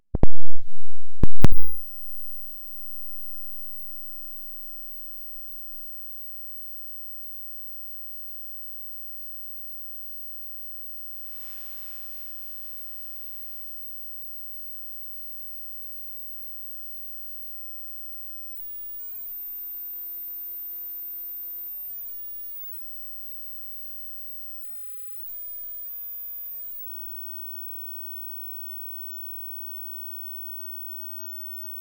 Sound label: CRT messing with knobs, electronic; SFX